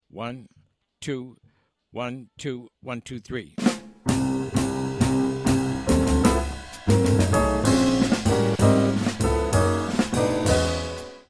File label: FAST minus melody